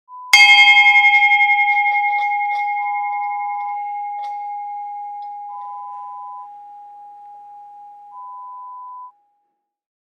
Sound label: Bell